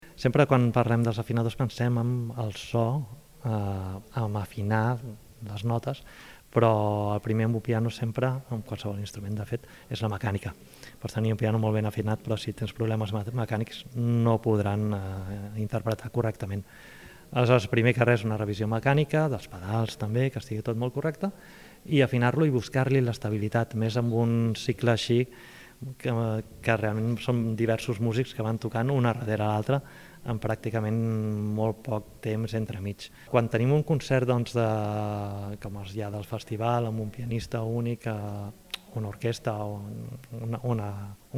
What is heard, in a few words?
piano; estival fringe una plataforma professionalitzadora per a joves musics